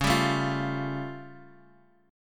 A Augmented 7th C sharp

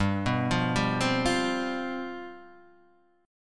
G 11th G, chord

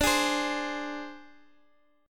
Ab Major Flat 5th D

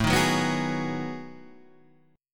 B 13th A, chord

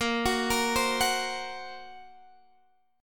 F sharp Major Flat 5th A sharp

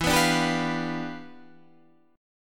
E Minor 7th Flat 9th E

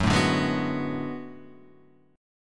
B Minor 13th Gb

